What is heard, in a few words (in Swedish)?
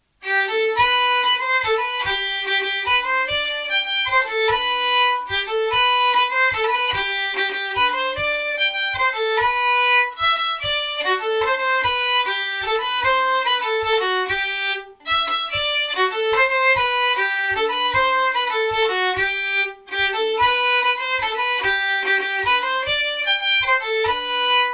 Polska; från Rämmen